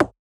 Percussion; FLPRO

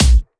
Kick, Kicks; FLPRO

